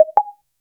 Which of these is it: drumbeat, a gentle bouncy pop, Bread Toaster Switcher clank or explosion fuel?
a gentle bouncy pop